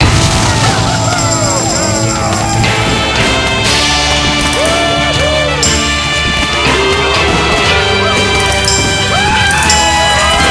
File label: super Mega Win No Loop; wild wild west